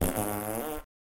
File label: Trumpet Fart